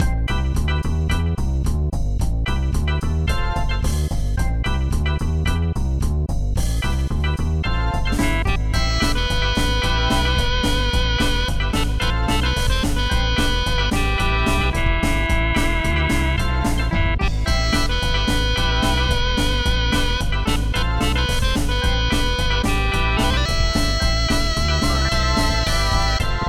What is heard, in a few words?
Infiltration, Dark Battle no echo; Custom Robo; N64 gamerip